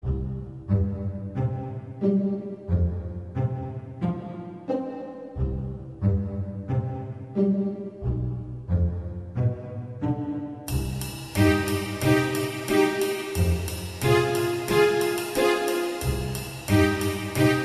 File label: suspense